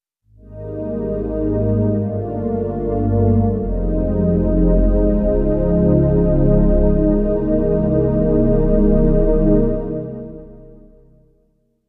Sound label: Movie Pad